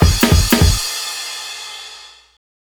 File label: drums, Hardcore Breakbeat, 152; Big Fish Audio; Hardcore Breakbeat Action; Robot Live